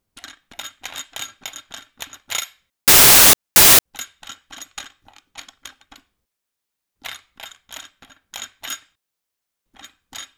Pulling Bar Threading & Unscrewing, Sounds Of Bodybuilding; Christophe Davaille; The Gym - Sounds Of Bodybuilding